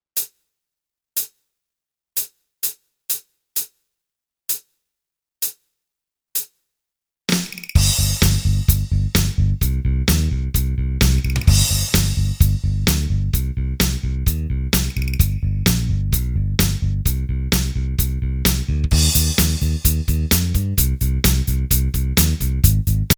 BASS & DRUMS

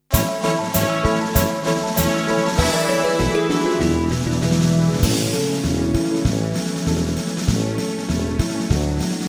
jingle bells; XMAS

